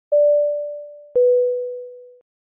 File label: plane beep announcement